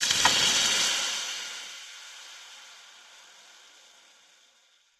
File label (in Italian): Cera Bollente